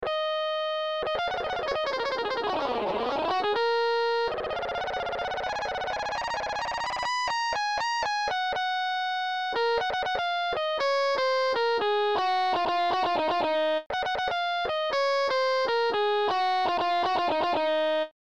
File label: Solo; The Walking Dead